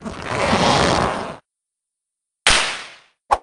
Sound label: suspend stretch & slap & fling; mamemomi